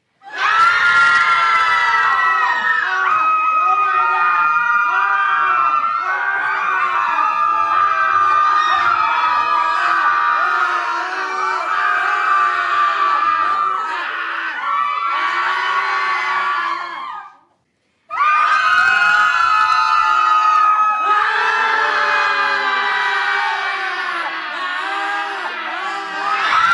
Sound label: Kids screaming sound